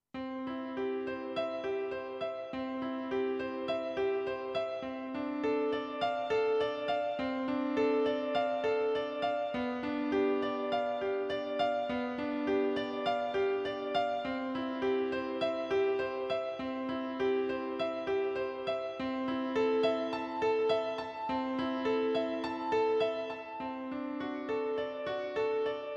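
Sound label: Grand Piano, digital piano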